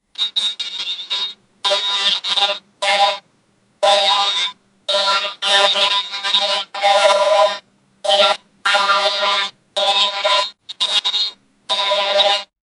the sound of the robots

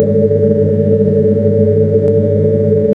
reactor overheat

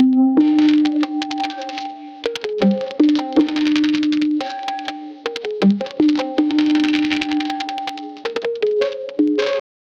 urban pulse drop trap; 8s

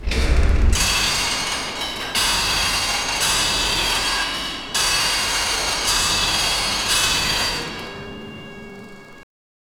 medieval forge machines

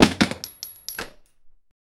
dropping item in inventor; inventory

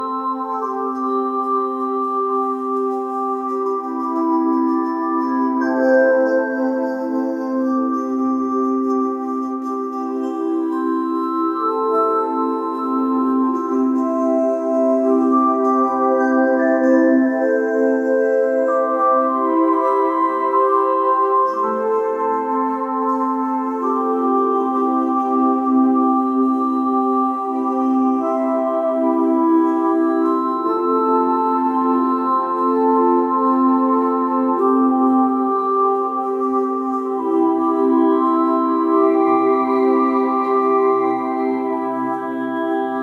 lullaby pad

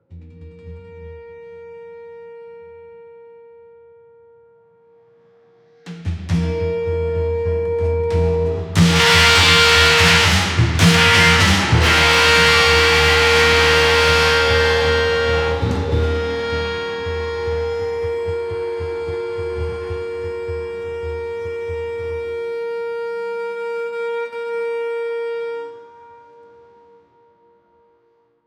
chaotic and powerful indian

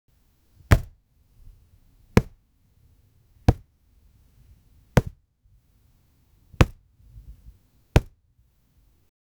tennis ball hiting on the

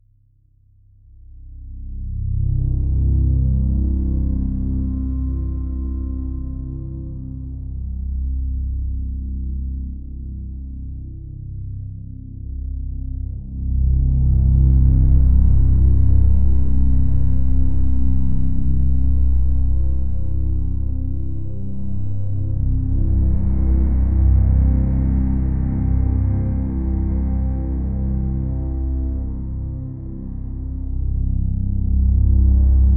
a brooding dark ambient

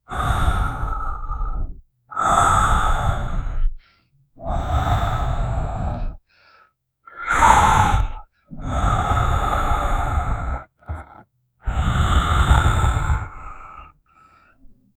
make the heavy breathing

